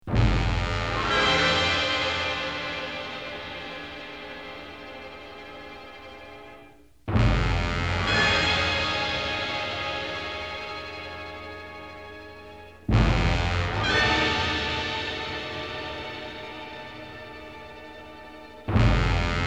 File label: Magic Smoke Chords; wizard of oz; soundtracks